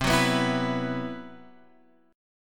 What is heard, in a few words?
C Major 7th Flat 5th C